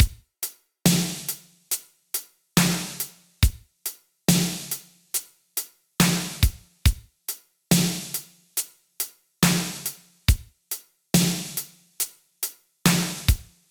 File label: 70 bpm drum loop b key; drum loops